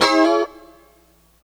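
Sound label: D#4 A mono slide R, guitars; Spectrasonics; Hans Zimmer Guitars VOL-1; 90's Sample CDs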